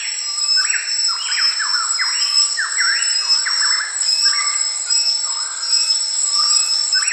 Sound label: HOT INSECTS; Zero-G Ambient 1